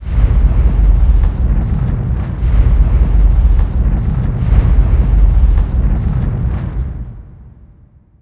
fire; RYL 1 v5.57

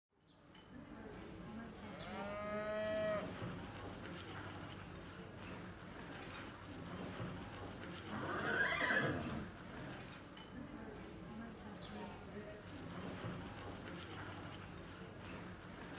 Ambience; Age of Empires III; cinematics

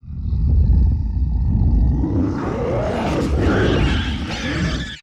Hybrid Growl, Vocals - Monster; Sony Pictures Sound Effects Series

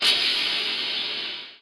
frost hit; RYL 2

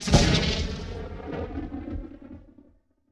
WWII Cannon Blast, Period Combat; Sony Pictures Sound Effects Series

